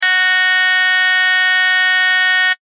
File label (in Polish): ton